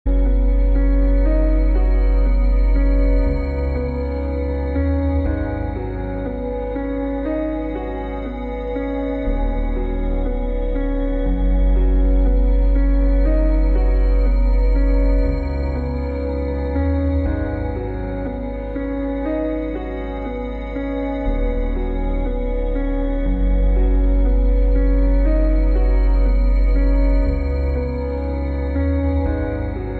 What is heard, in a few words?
ambient; zero bedroom apartment